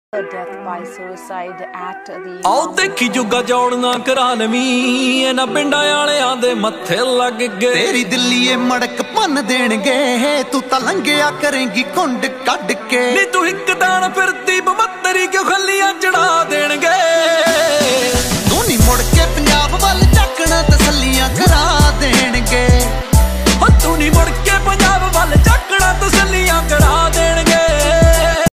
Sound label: Desi Punjabi Ringtone